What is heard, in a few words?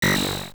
Sword Clang, Sword clanging against a wall or shield; AOL